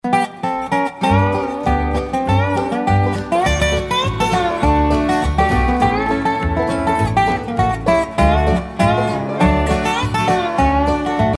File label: dobro